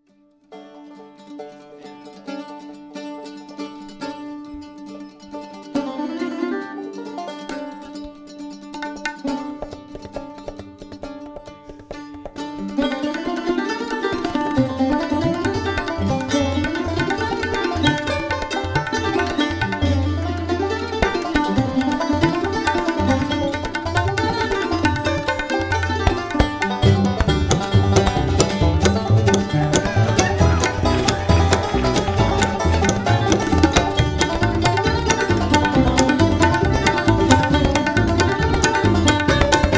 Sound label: fiddle blues